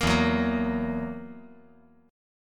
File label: E Suspended 2nd Flat 5th E down